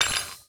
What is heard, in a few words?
ui purchase slot